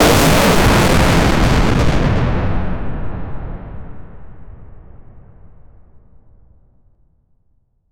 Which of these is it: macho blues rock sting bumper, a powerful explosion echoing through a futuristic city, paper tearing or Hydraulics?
a powerful explosion echoing through a futuristic city